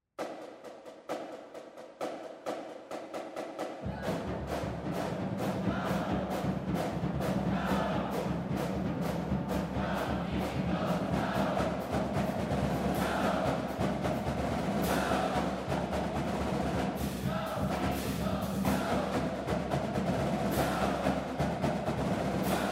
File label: Drum Cadence